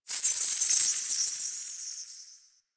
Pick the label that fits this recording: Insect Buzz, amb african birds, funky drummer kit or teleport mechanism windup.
Insect Buzz